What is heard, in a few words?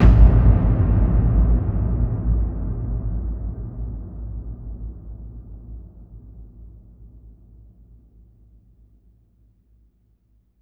BIG BOOMER, Impacts, Hits & Booms; Distored Reality